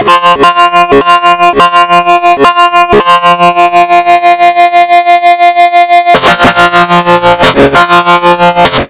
dist loop

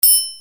SP TRIANGLE, EMU SP1200

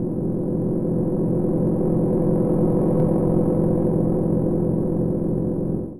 bug rumble, Drum N Bass; Sonic Foundry (Sony Creative Software); Knutrix Drum & Bass

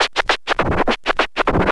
scratch short, Vinyl scratches

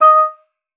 D#5, OB D.AMORE; Xsample VOL-7

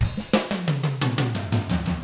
116 SHUFFLER, Drums

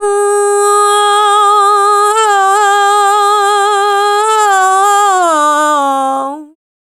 FEMALE, Dance Vocals; Best Service ProSamples vol.12; AKAI

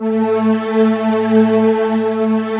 AMBI PAD

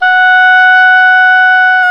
WND OBOE F#5; Roland L-CDX-03 Disk 1

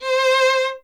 Vln Detache, Solo Instruments; Miroslav Vitous

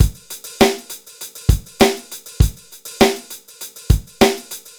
dry loop, BPM100

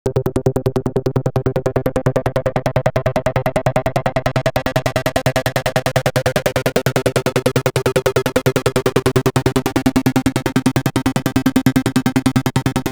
Knob, Industrial, fx

